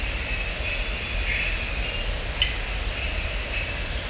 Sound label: loris vocalisations